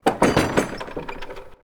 rattle

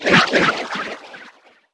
1080 spin; TrickStyle

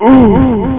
ungh die

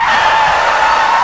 SKIDDING; Shadow

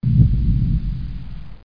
stomp

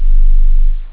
sub base, bass, synth; OctaMED SoundStudio V1

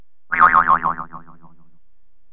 boing; 16bit; SoftKey Entertainment Pack; SoftKey Multimedia

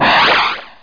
WHOOOSH; Solcity

